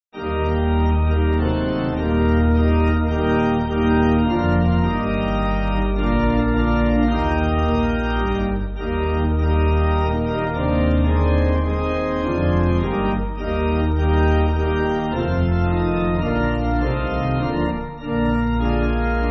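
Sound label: Eb Pipe, Pipe Organ, Music Backing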